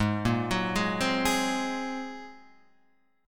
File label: Bb 7th Suspended 2nd Sharp 5th Ab